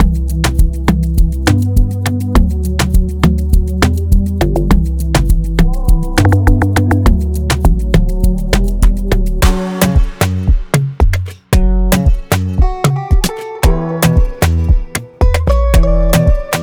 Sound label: Afrobeats; Jeje Afrobeats Pack